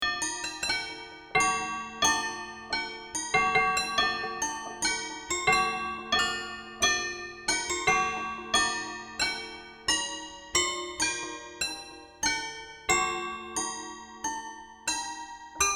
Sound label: Reface CP Distorted Toys